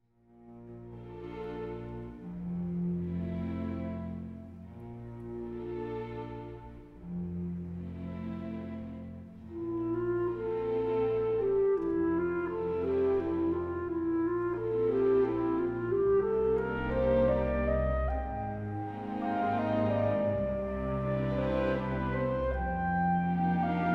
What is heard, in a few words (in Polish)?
Walc; As-dur op. 69 nr. 1